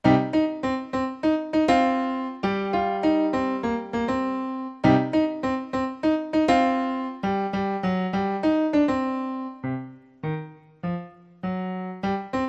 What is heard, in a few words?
Jazz Blast